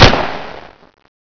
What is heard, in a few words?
thompson shoot